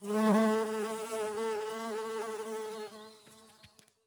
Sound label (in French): Bourdons beau massif la; Vols Bourdn abeilles